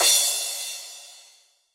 Cymbals crash; Vengeance Samples

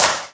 Claps; Vengeance Samples

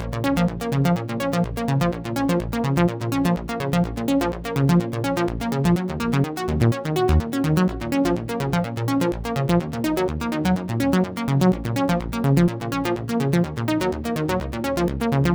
Melody 3, 125BPM; Vengeance Samples; Cutter Kit

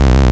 Synth Bass; Vengeance Samples; VEE Synth Shots

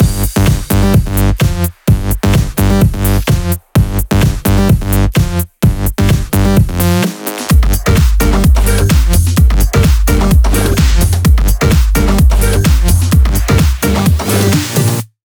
128BPM Heavy Duty Mix; VFH1 128BPM Heavy Duty Kit

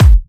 Hard Kicks; Vengeance Samples

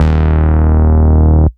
Root D#, Bass

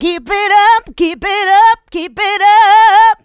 voc, female vox, Vocal House

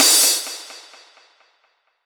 Crash, Cymbals; Vengeance Samples; VEH3 Cymbals

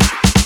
Fill Ins 128BPM; Vengeance Samples; VEH2 Loops

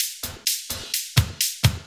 Electro Loop 128 BPM; Vengeance Samples

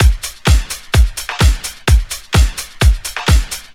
House Beat, 128 BPM; Loops; Vengeance Samples

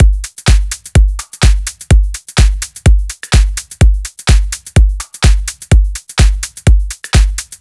126BPM Drums; Vengeance Samples; Klondike Kit

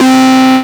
Synths C; Vengeance Samples

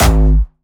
Jumpstyle Kick G#1; vengeance essential club sounds-3; VEC3 Bassdrums

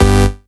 Synths G; vengeance essential club sounds-3

